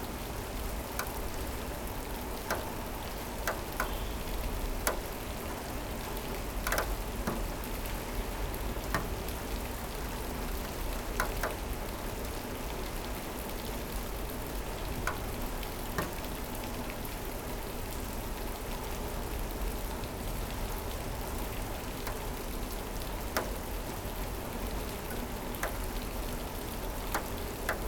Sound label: rain window; 99Sounds; Rain And Thunder